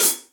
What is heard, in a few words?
Hihat Pedal Direct Natural; Drum Hits; Standard Hits; Ableton; Factory Packs; Session Drums Studio